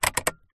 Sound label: transport car fan speed dial click turn toyota corolla